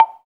PRC BLOK, Orch Blocks; Roland LCDP03 Orchestral Perc; Orch Toys

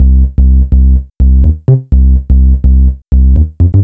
bass 125; BASS LOOPS; House; Best Service ProSamples vol.10 - House; AKAI

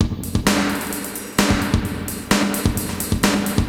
Spring Verb HR-16B (130BPM), cyborg beats

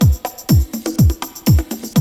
125 Loop

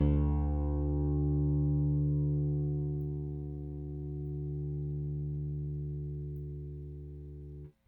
Rick Thumb A, Rickenbacker Bass; Airwindows

